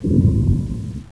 foot

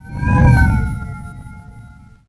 FLYBY; XCOM

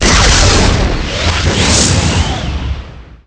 speed up; pcrazy; Bovine

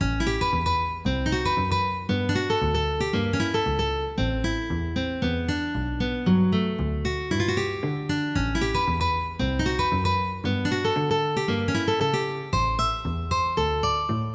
music

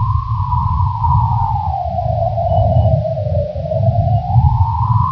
wind; CHASM

